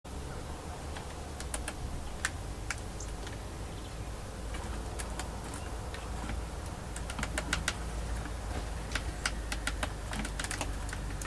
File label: middle spotted woodpecker sound